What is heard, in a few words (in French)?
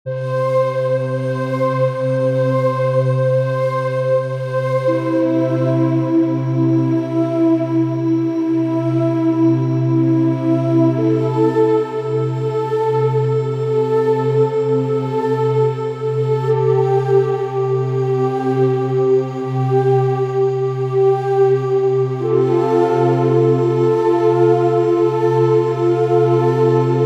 Sonnerie Drone Matin